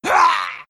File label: pain; lone druid